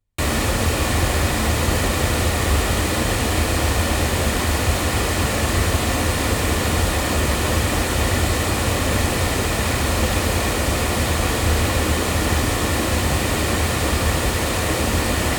Oktava MKL-2500 loudspeakers

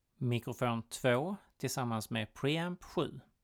mic pre

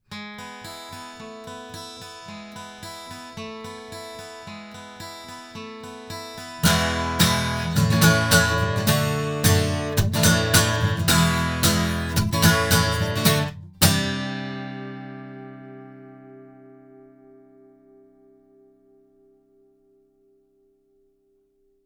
CK-7 8 guitar; Avant

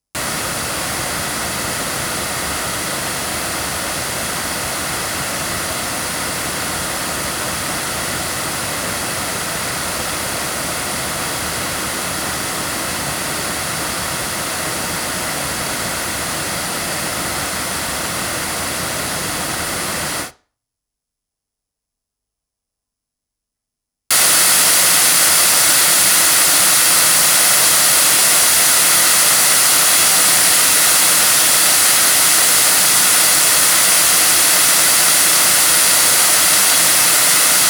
Shure Beta58A loudspeakers

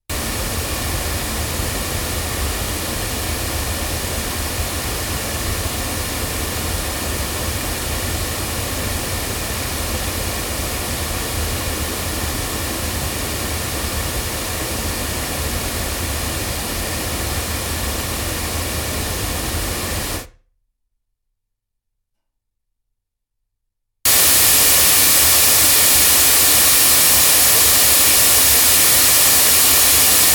Rode NTK loudspeakers

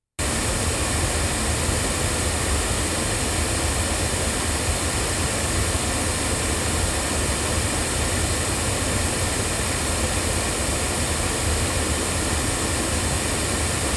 MXL 603S loudspeakers

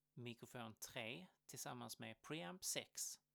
mic pre

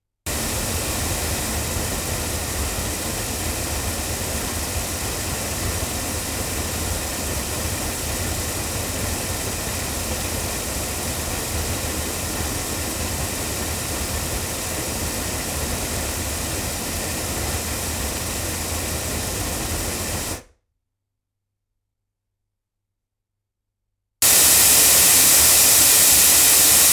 cardioid loudspeakers; studio projects

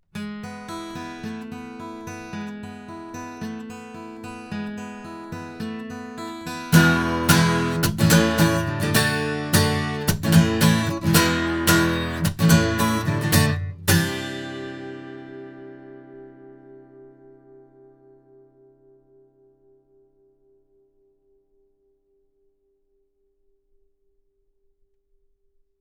TC Mk9 guitar; microphones; ADK

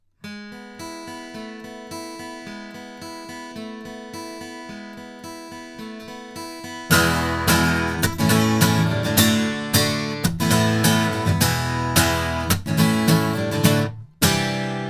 guitar

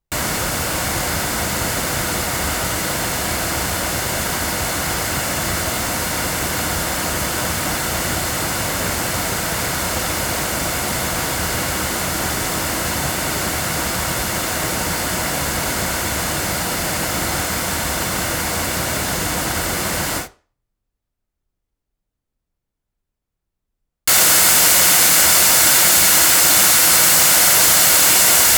Blue Yeti Pro cardioid loudspeakers

